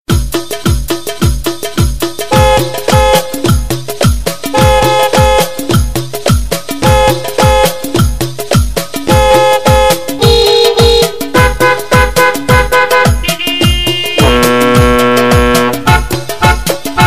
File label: traffic